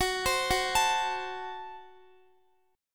Gb Diminished Guitar

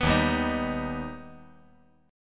D sharp Augmented D sharp, chord; D#+ strummed; 4,3,3,6; JGuitar

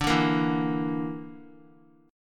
D Suspended 2nd Sharp 5th D down Guitar; strummed

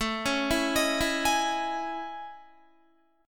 A Major Sharp 11th, Guitar; AM#11 arpeggiated

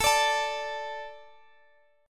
D 5th A

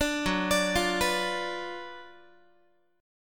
Gb Major 7th Suspended 4th Sharp 5th, Guitar

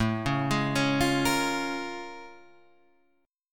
Bb Minor 7th A; Bbm7/A arpeggiated

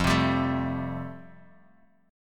F Major Add 9th, Guitar Open G

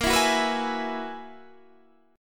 Gb 11th Bb

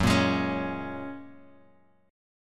Gb Major Add 9th, Guitar Open F

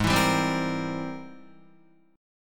Ab Augmented Major 9th Ab Guitar; strummed; 4,7,5,5,7,5; Ab+M9; JGuitar

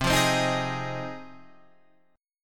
Ab 6th C

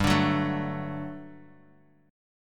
G Major 7th Suspended 2nd; strummed

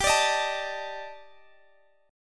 Eb Major Sharp 11th G

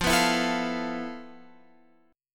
F Major 7th Flat 5th, Guitar, Open F; FM7b5; x,12,11,11,12,12; strummed

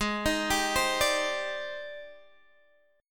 G sharp Major Sharp 11th, Guitar Open G

